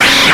Grito

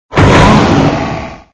Grito de Rillaboom

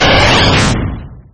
Grito de Flareon; Actualizado a la sexta generación

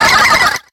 Grito de Spinda

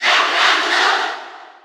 Grito del público (Japones); Duck Hunt; SSBU

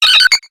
Grito de Togetic; Actualizando grito en la sexta generación (XY)

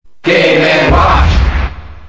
Grito del público; Mr. Game & Watch; SSBM

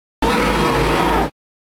Grito

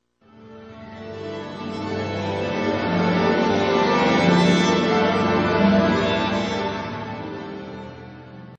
Música inicial; Hanenbow; SSBB

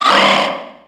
Grito de Duskull

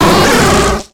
Grito de Ampharos

